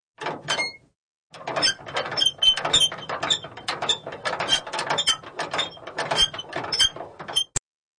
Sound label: Track, Doors; 헐리웃특수효과음; 문 소리